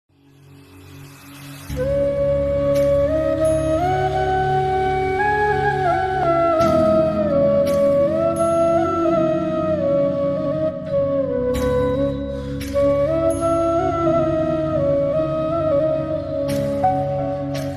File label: Slowed Reverb Ringtone, instrumental flute